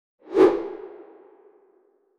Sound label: whoooooshhh swing, game; game sf; sound effect